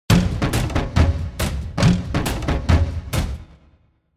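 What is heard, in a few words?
game background music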